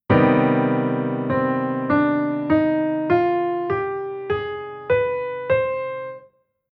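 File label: Chord Scale Minor Harmonic Minor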